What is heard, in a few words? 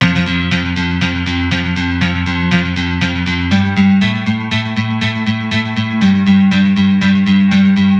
GTR Bypass